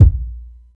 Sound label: reverb kick drum a sharp key, bass drum single hit tuned to the A# note